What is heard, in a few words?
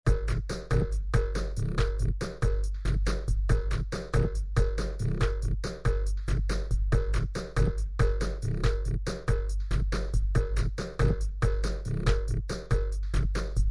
tinny fun band beat; SAMPLED MUSIC LOOPS